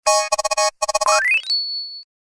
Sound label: Accelerated Tone; Samsung SGH-i560